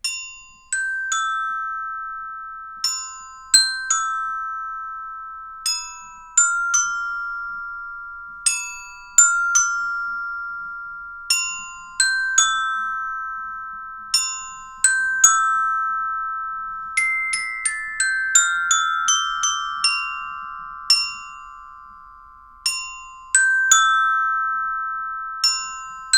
glockenspiel

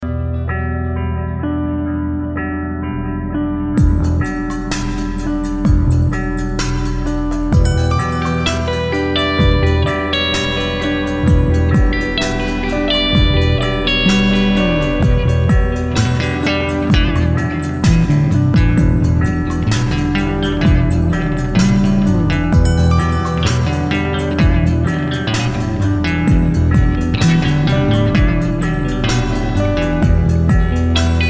Toddler Guitarist; admiralbob77

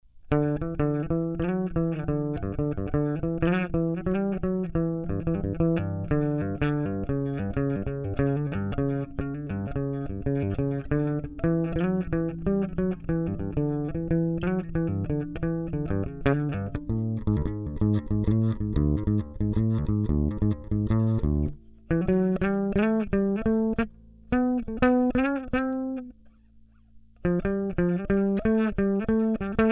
Bass Phrases

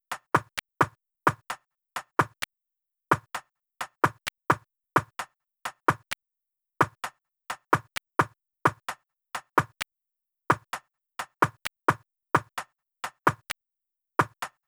drums

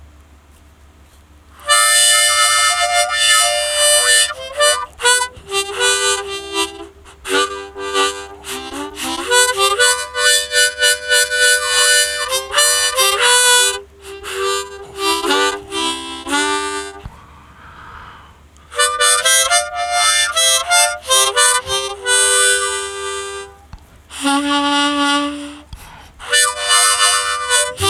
Ceramic Slide

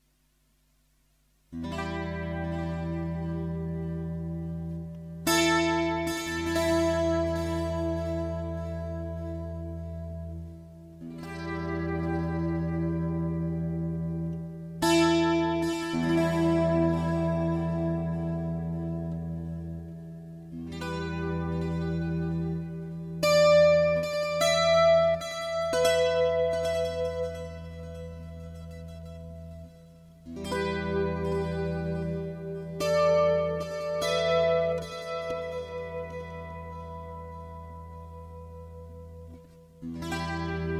Em100 GTR Vol Ped motif

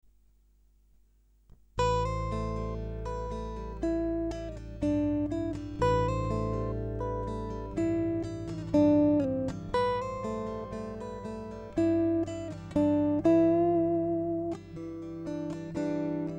AGEGAE 120 GTR arpeggios

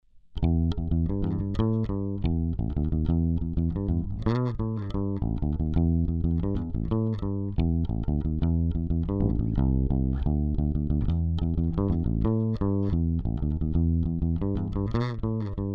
Bass Phrases

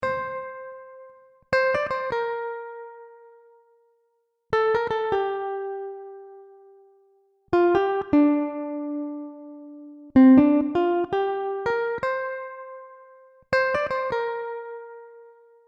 instrumental

